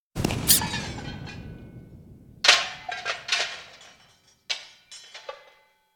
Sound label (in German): Katastrophen Explosion Fallende Truemmer und Klirren